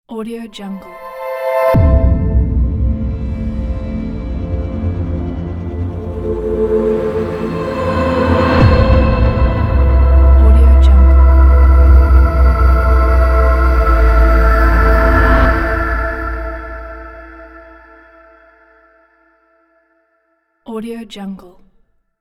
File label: cinematic logo reveal